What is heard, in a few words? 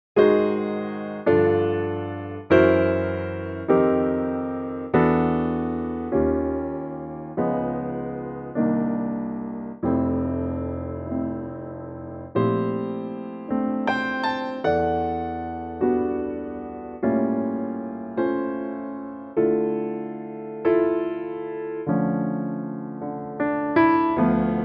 piano F; Pianotrak; key - F - vocal range - D to E (optional F)